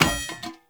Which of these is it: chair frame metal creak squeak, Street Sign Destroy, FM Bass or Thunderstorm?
Street Sign Destroy